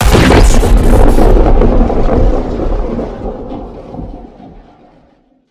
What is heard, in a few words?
sniper shoot crit; freak fortress 2; sniper ops; nighteye